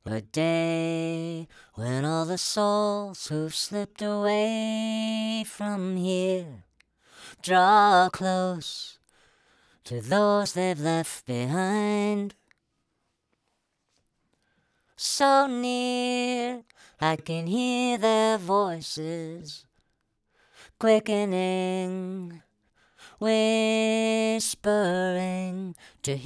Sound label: formant up 1 third; retune